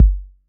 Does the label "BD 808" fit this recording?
Yes